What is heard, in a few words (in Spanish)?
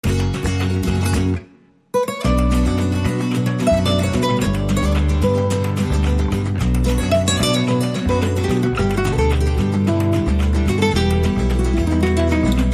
Flamenco Apasionado